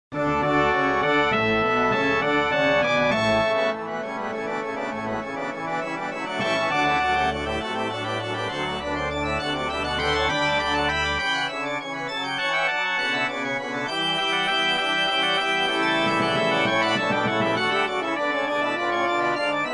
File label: Organ and Brass